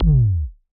Ball Collision Forcefield Med

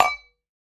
Ball Collision Holiday Mug Hard

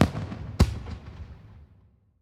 Fireworks

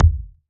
Ball Collision Geo Tackleball Hard

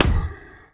Ball Hit Med Breathy; SpinalServe

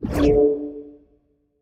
Training Ring Player Through; Sports

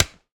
Pickelball Paddle Hit Ground Hard, Ball Collision

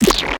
Slime Shoot; Deathrun

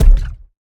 Ball Player Hand Hit Extra Hard

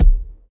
Ball Player Hand Hit Tackleball Med

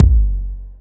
Ball Collision Geo Extra Hard

